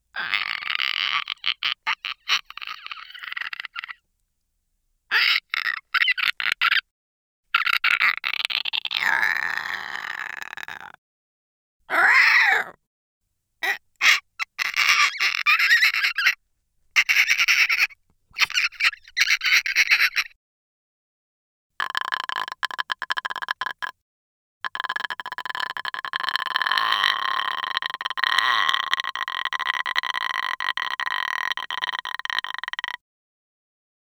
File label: Creature Sounds; ENG